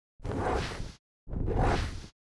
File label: Two Men Jump Into The Air